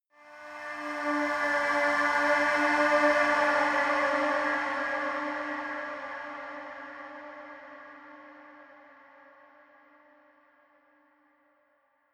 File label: erratic cry dark, drones